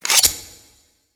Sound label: snip; tf2; garrysmod